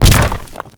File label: Colossus Hand C; Warframe; Colossus Boss